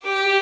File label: violin